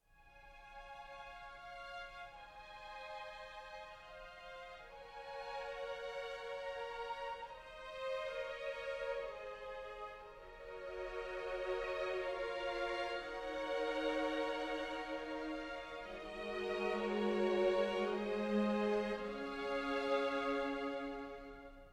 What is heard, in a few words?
Orchestra Choir Tracks; Jesus Once of Humble Birth